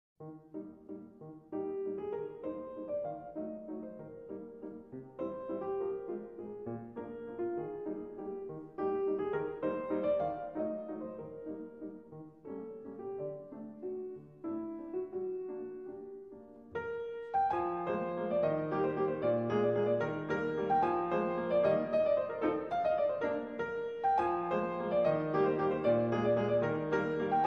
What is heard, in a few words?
Waltz in E flat major, piano; 1878; Tchaikovsky; Op.39 Nr.8